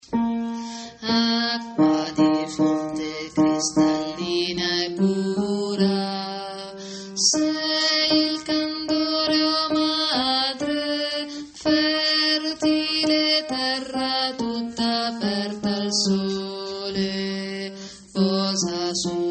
tenori

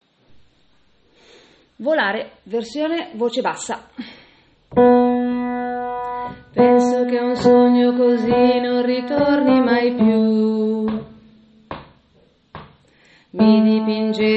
voce bassa

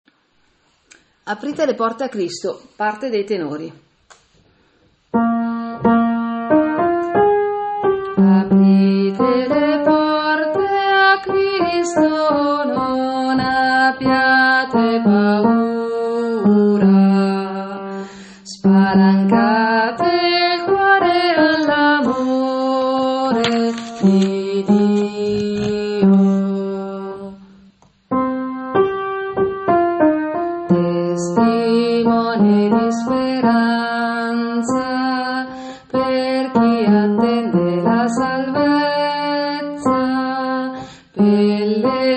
aprite le porte a Cristo tenori

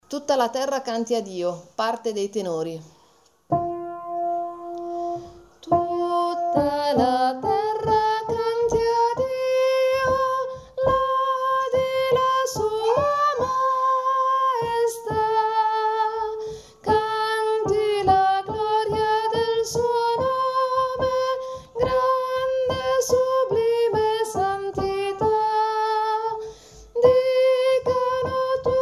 Tutta la terra canti a Dio tenori

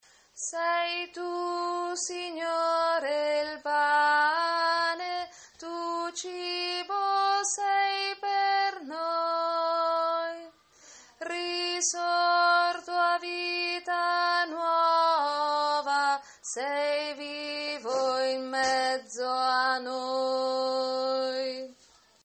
sei tu signore il pane contralti

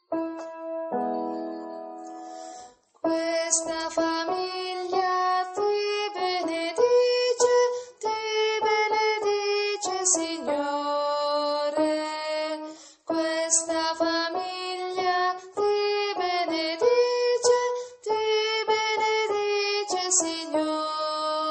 questa famiglia contralti

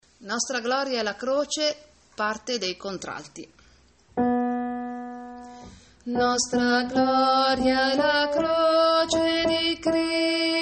nostra Gloria La Croce Contralti

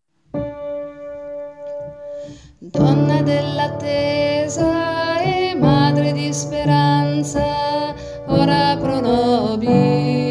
ave maria verbum panis strofa soprani

